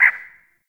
Cactus Wren Bird; Warframe; The New War; Zariman; Apartment; Scene Four

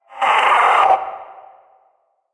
Possessed Red Veil Vocal Death; Warframe; PriestQuest

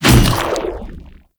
Shadow Mace Whoosh; Warframe; Weapons; Shadowgrapher

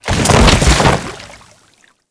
Grineer Fall Water, Body Falls

